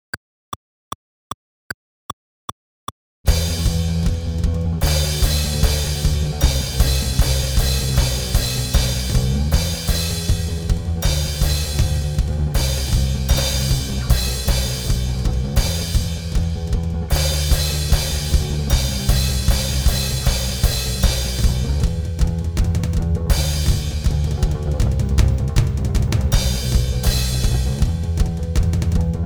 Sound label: Second Solo Backing Track